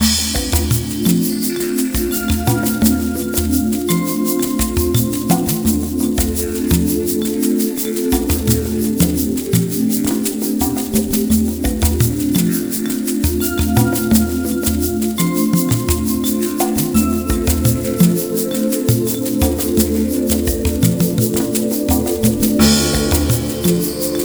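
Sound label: background music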